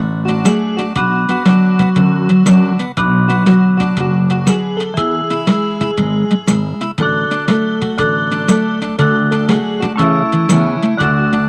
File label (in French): printemps te voilà instrumental